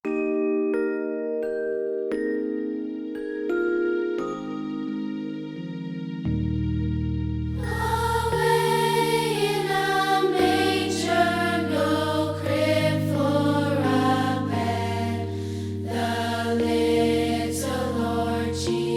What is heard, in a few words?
Choir